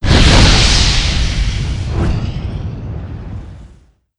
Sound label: fire; doom3; archvile